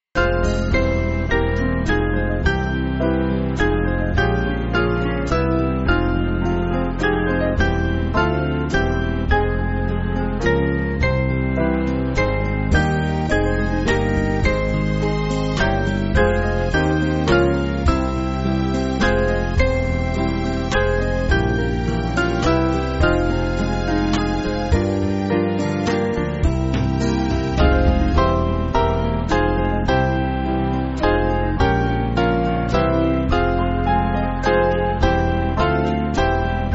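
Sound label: Band, Music Backing Files for Churches; Small Band; 4/Fm; Crowle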